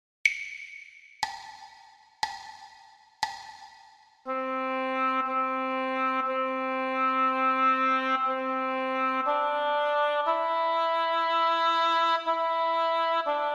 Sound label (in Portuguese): percepcao ditado